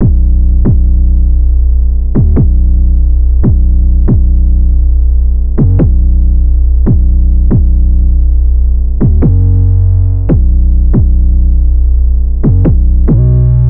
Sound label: 808 loop 140 Bm; Slate Digital BASSHOLE Sample Pack; 808 Loops